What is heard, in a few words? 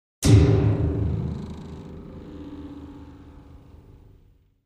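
Grand Piano Strings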